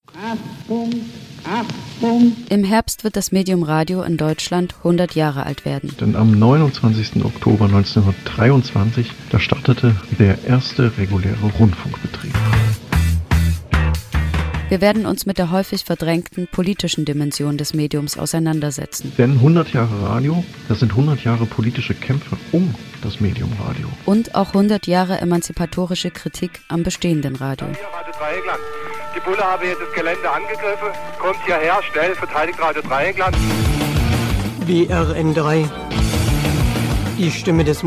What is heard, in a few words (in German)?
radio jingle; 100 Jahre Radio; Karlsruhe